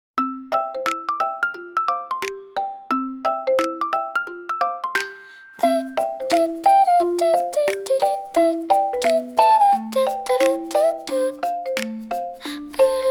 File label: afterschool whistle, free bgm